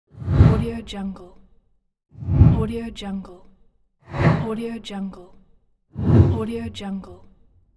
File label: swoosh transition intro